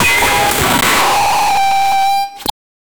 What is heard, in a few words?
massive cascade of 1000 g, glitch